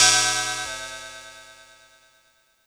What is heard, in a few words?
Cymbal FX